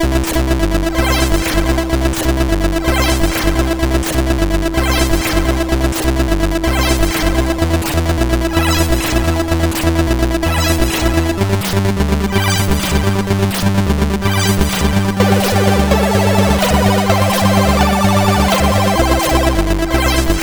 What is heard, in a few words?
Construction Kit intro, sid CSG8580R5